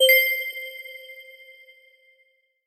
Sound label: new jackpot; gold